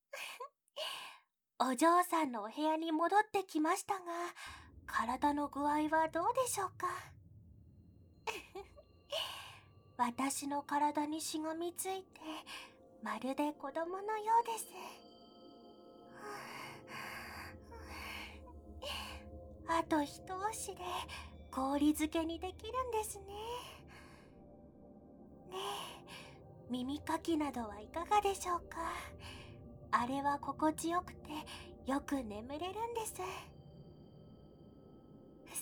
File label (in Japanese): 耳なめ; 私にお嬢さんの可愛い声…聴かせて。; おやすみトラック